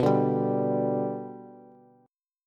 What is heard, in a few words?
B-Minor Sharp 5th, Bass; strummed; JGuitar